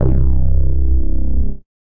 Deep Move Bass; Synth

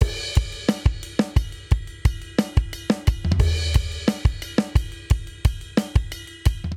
Straight Five Rock 07 Outro 5_4 177 bpm, drum; Drum Booth

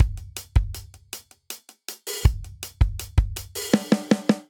Straight Six Rock Buildup 6/8 80 bpm; MIDI Clips; Ableton; Drum Booth

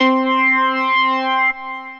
Aqua Fundemental Keys, Synth Keys

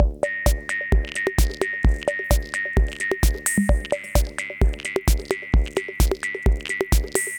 C78 130 Beat, Drum Machines; Montrose; Ableton

